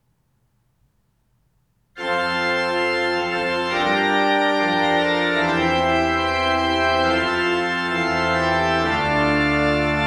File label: Fanfare